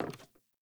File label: Sandals Walk Wood, Footsteps; Characters; Player